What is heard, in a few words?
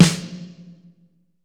AMB S, HALL KITS; Crypton - DrumBase5000